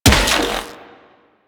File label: Rifle Charged Shot; Warframe; Infested; Quanta